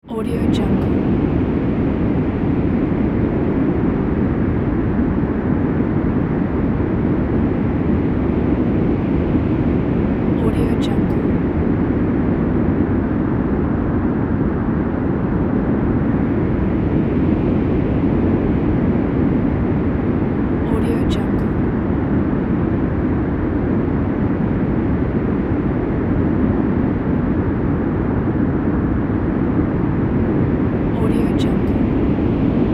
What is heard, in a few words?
hot sand storm wind